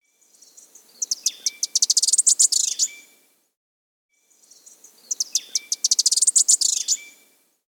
Ash-Throated Bush-Tanager (Chlorospingus Canigularis), Andean Birds; S. America